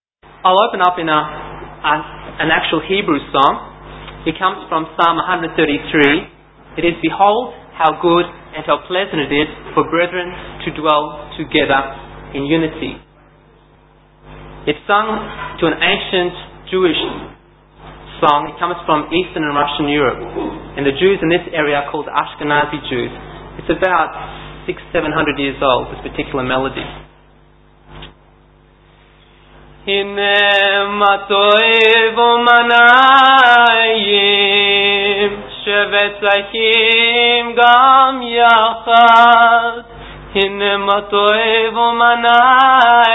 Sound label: Song Solo Hebrew